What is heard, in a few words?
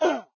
Enemies Common Dead